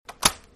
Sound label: favorite unclick